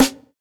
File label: Snare, jungle breaks drum sounds; ORIGINAL JUNGLE BREAKS; JBK DRUM SOUNDS; JBK SNARES